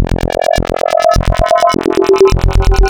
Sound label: Spectral, wavetables; Architecture 32